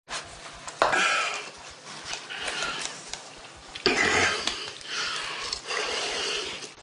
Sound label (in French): Respiration